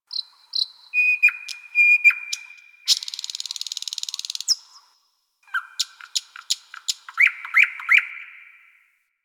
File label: Tree Critters; Ambience; One Offs; Warframe; Eidolon Plains